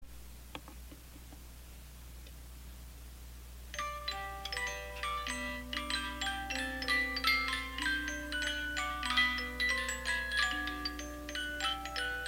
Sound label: college song